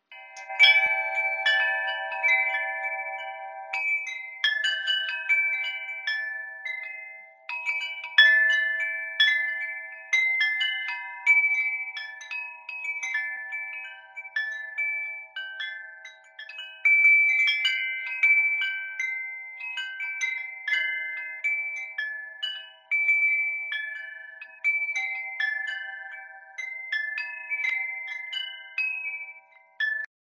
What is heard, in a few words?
Agate Chimes Sound